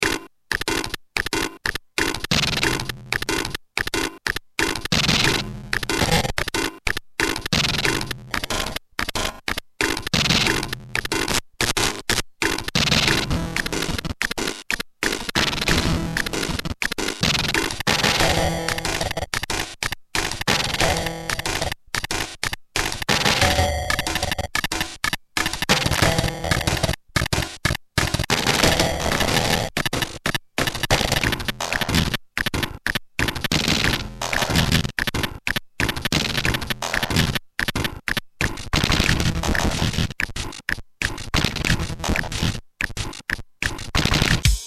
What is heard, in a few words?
xr10, drum